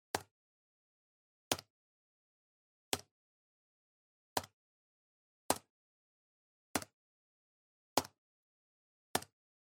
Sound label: PC-Keyboard (Single-Hard)